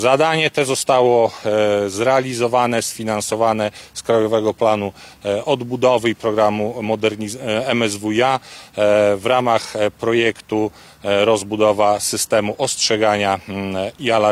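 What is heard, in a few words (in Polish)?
syrena, siren; trwa modernizacja systemu ostrzegania ludnosci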